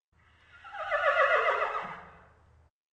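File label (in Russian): ржание в деннике подальше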